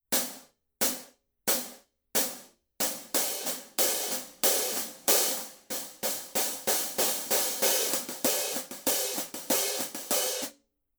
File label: masters 16 thin hi-hat pattern, paiste